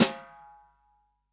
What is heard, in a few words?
SWING SNARE CHROMATIC, Real Drum Kit; REAL DRUMS